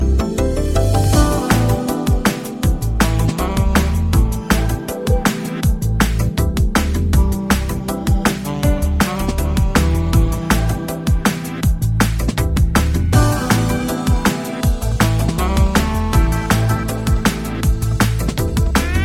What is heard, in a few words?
loop mix jazz